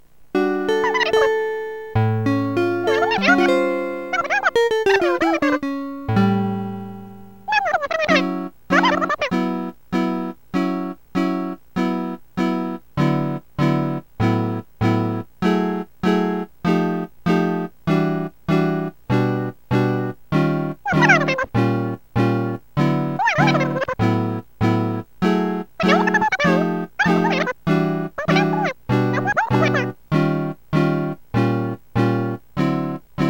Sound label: Swing; animal crossing let's go to the city